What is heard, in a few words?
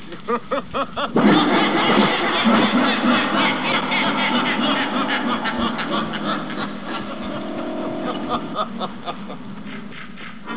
hahahaha